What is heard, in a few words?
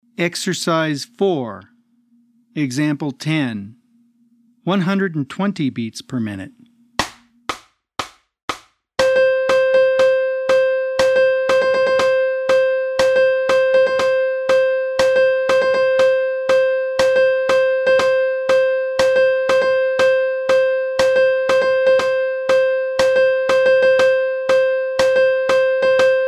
120 BPM